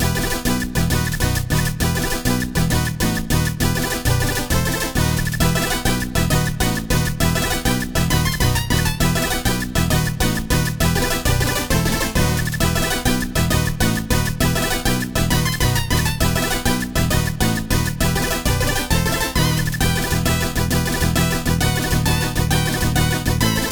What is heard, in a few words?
music boss loop